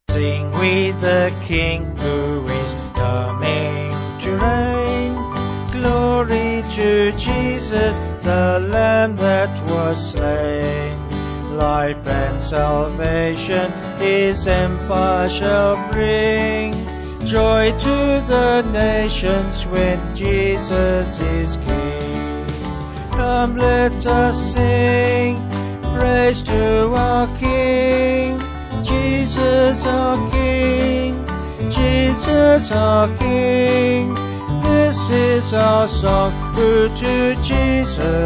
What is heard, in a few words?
Vocals Band; Sing we the King, who is coming to reign